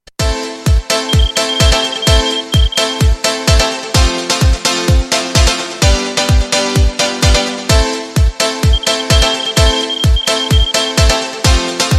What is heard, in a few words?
new piano remix song